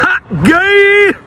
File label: hah